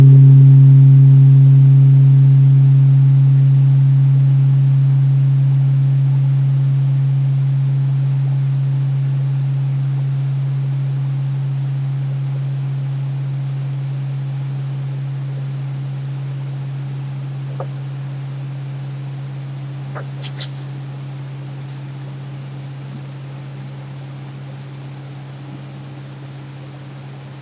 om weighted, tuning forks, cosmic mid om weighted

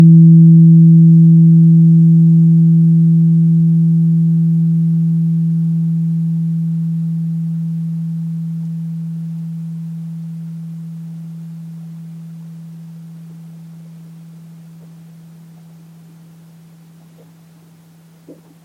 gallbladder, tuning forks, human organ weighted

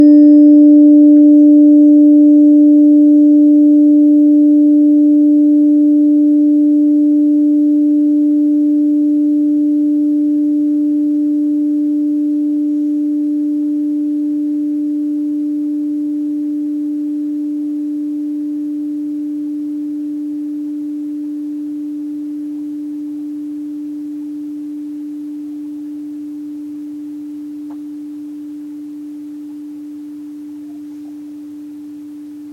tuning forks, fat cells

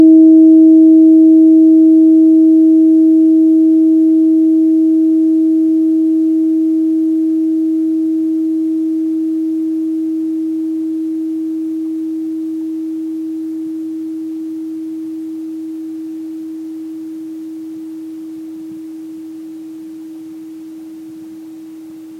metal, tuning forks five element

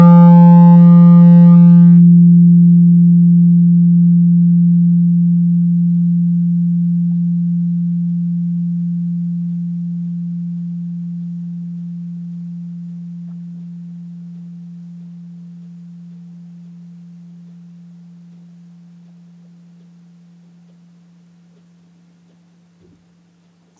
crown, tuning forks, chakra weighted

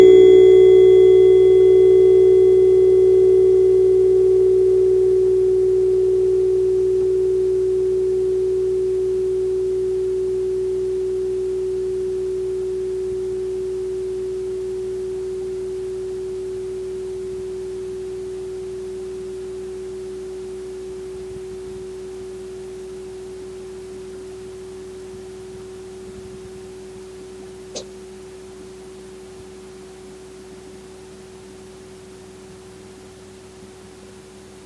central, tuning forks, meridian

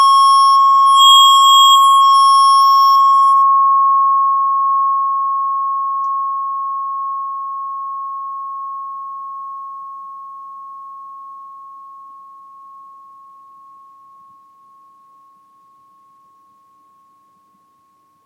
keter, tuning forks, kabbalah

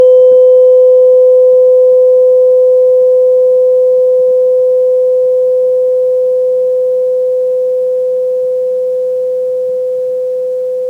gall bladder, tuning forks, meridian

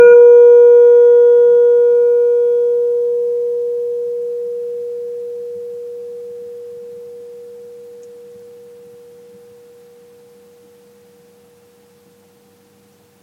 phosphorus, tuning forks, minerals

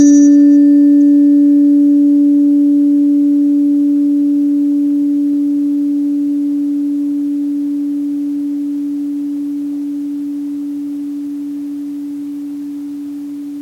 earth, tuning forks five element

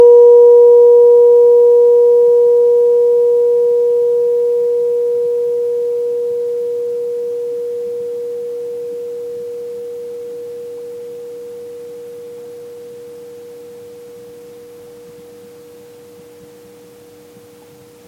water, tuning forks five element

